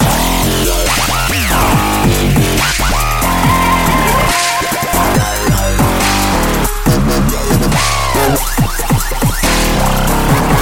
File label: NEW DUBSTEP